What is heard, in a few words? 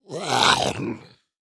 Horror Demon Taking Bite